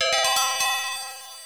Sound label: bonus; magicor